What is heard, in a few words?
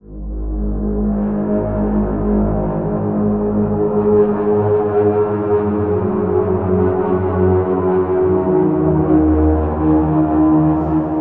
Pad; Routed Sound; Spire Expansion - Noble Gangster Vol.1